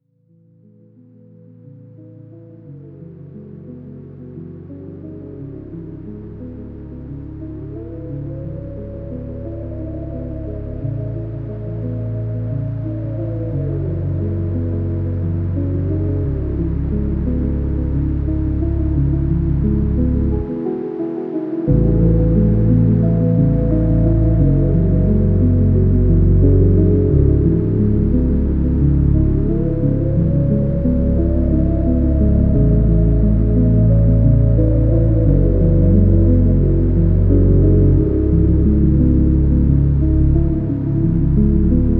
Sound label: slowed + reverb